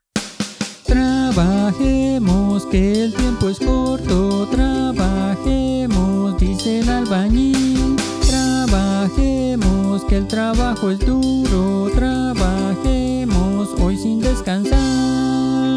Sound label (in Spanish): Cancion Albanil